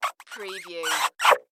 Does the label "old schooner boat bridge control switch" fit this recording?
No